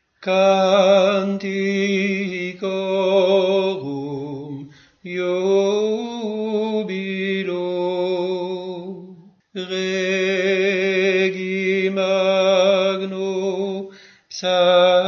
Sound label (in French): alto; canticorum